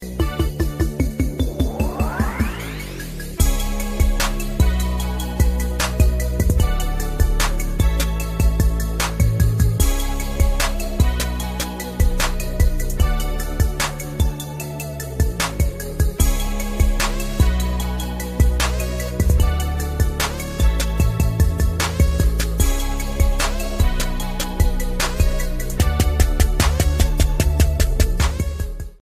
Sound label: beat ringtone